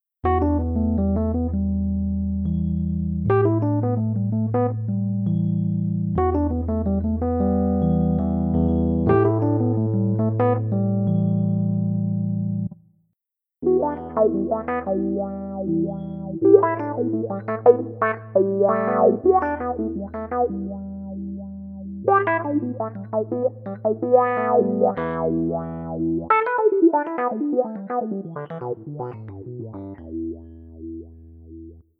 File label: Wah Test